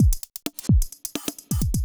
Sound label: electro kit 130